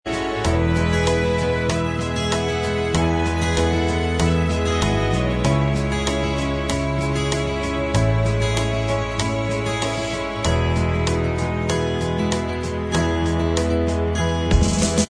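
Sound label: Instrumental Track, backing tracks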